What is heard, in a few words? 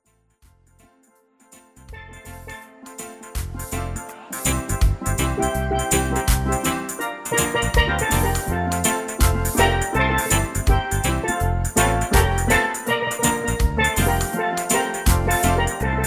One Heart Instrumental